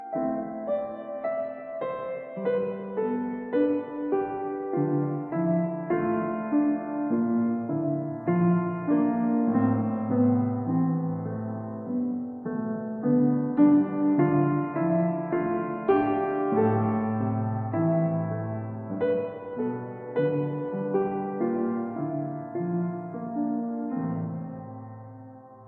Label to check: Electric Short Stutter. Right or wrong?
Wrong